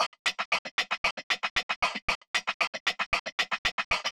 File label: percussion guiro